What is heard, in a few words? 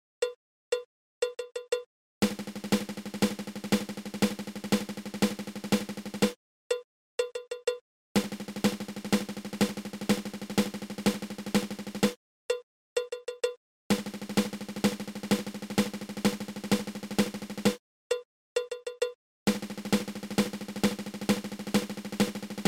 Double Paradiddle